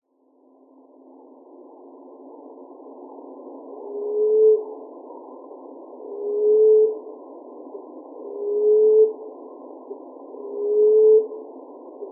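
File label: common emerald dove, miyako; koe; kinbato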